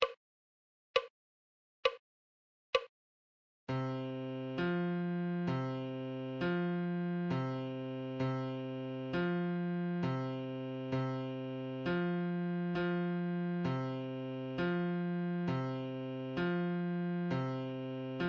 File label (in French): Clé de Fa Adagio